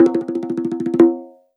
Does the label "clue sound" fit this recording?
Yes